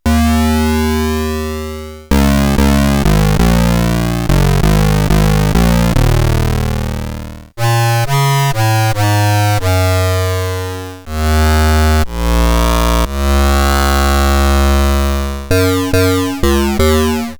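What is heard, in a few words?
bit twiddling synth sequence example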